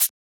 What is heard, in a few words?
Shaker